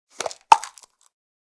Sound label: Plastic pill bottle twist cap opening